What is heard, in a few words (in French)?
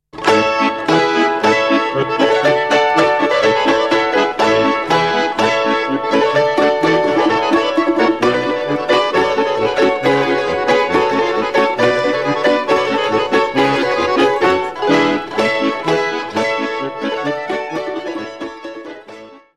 Kadril - Russie; Wolzhskaja Kadril